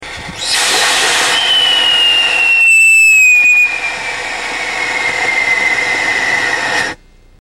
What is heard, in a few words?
sodium benzoate core grainy, rockets, whistle tests; Iron Oxide; Core Burner; Very quiet compared to other whistle mixes (not useful)